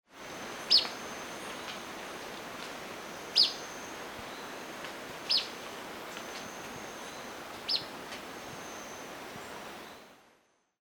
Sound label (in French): moucherolle a ventre jaune